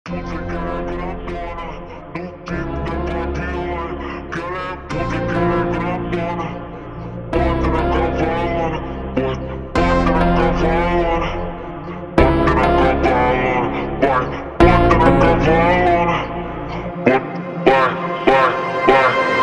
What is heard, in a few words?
Phonk funk super slowed